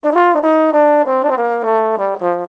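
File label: valve trombone toot; Musical Instruments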